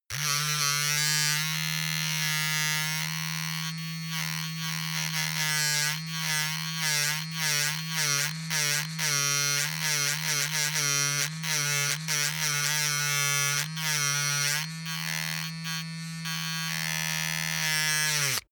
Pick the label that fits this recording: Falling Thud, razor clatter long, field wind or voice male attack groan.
razor clatter long